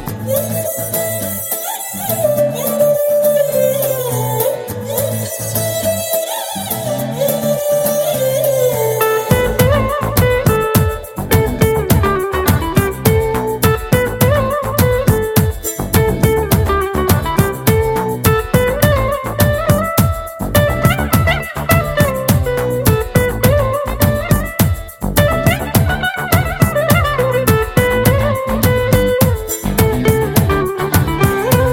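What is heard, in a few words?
BG Music Telugu Ringtone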